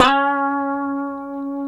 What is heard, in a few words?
C#4, Guitars; Spectrasonics; Hans Zimmer Guitars VOL-1; 90's Sample CDs; COMP POP+VIB